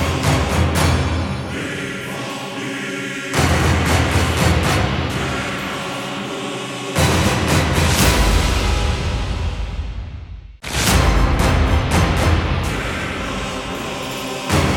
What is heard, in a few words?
Mech Gate Spawn Cin Music; Warframe; Deimos